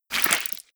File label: debris flesh, bullet impact debris